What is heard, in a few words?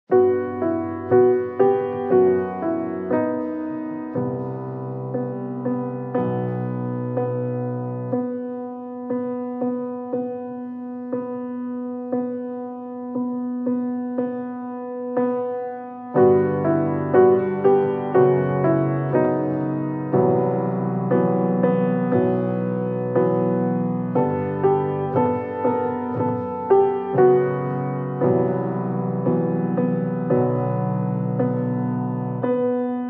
Piano End Titles; Lady in the Lake